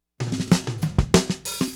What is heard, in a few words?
Drums Intro, 70s Analog Funk; Sonic Foundry (Sony Creative Software); What it Is!